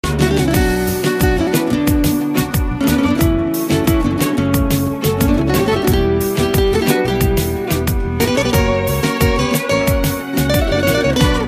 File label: Persian Ringtone